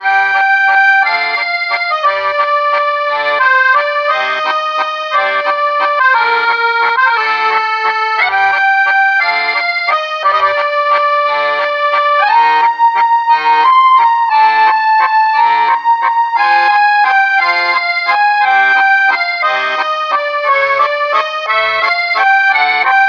Accordion Solo; Just Cause 3

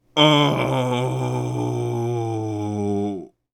Grunt

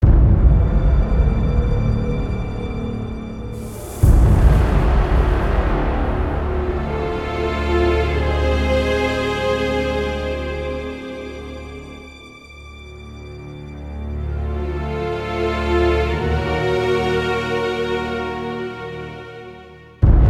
Danger Dialog; Shadow Warrior 2 gamerip 2016

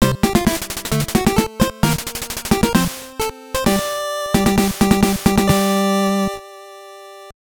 Received Important Item; Fire Emblem Ankoku Ryuu to Hikari no Ken

